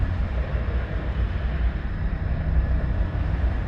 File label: distance hive minion idle; Stellaris; guardians